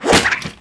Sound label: whip parried; DAoC